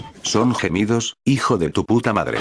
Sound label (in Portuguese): gemidos